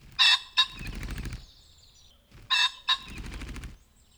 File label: Ring necked Pheasant